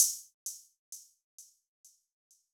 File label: Drum Loop - 130 BPM Open Hat; Loop Stems; Cymatics; DOPE DRUMS VOL. 1; Hunted Drum Loop